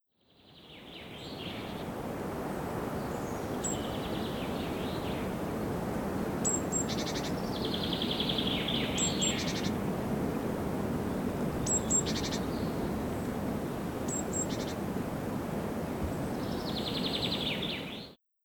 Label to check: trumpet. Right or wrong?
Wrong